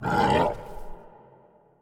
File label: Pig growl